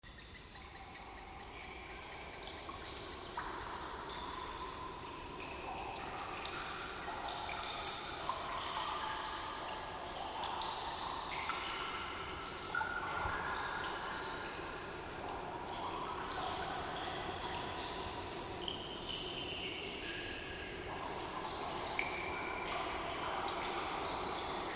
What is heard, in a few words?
water catch